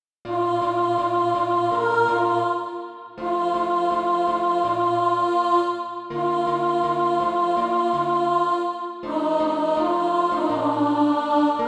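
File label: alt, Practise Music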